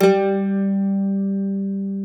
MANDOLIN

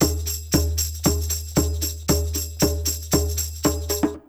TRIB PERC, Roots Disco; USB Soundscan vol.32; AKAI

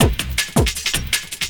Loop, Drum & Bass, 160 BPM

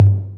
Tom, Drum Percussion Single Hits; Zero-G; Nu Disco

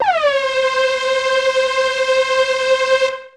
Techno leads, hits and riffs

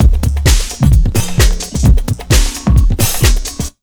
JUMP 130, Big Beat Drumloops

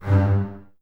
Basses G 2, String Ensembles; Miroslav Vitous; LINKS ORCH

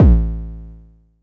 Low Kicks A1; LIMAT Div Dept; Drums

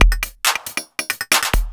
percussion loops, 138, Techno

